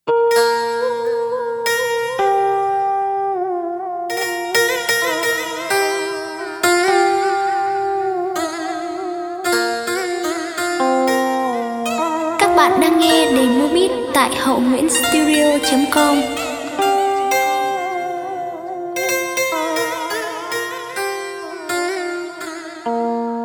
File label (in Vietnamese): Dung nhac chuyen long beat